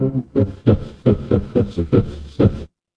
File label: mechanical engine